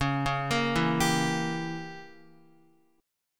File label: C sharp Diminished 7th C sharp down Guitar 4F 4A 5C 5G 5C 6E; C#dim7 arpeggiated; JGuitar